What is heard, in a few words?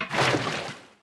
fluid empty; TFC Quick Pockets